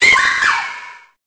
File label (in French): Cri